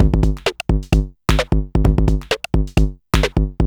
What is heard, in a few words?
Beat (130BPM), techno; techno drum fx